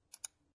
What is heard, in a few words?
Mouse Click Single